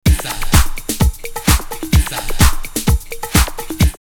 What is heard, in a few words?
Army Beat 128, drumloops, Rave; X-Static Goldmine; 90 s Sample CDs